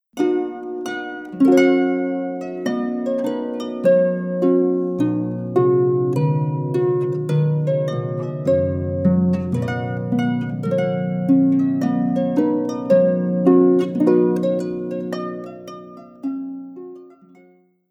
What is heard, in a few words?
trumpet tune